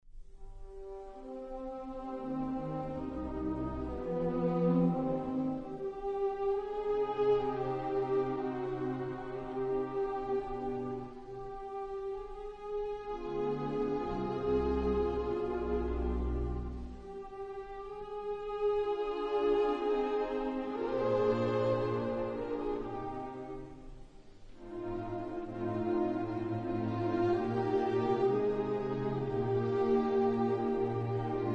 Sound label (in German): Klavierkonzert Es Dur Allegro